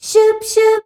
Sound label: "SCHUBSC" A, FEMALES